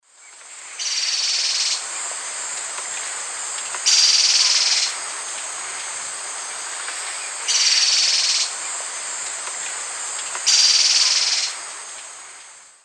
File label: Myrmotherula hauxwelli, churr call, S. America Eastern Lowlands